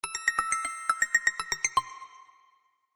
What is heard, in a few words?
ring synth 04 ringtone galaxy sms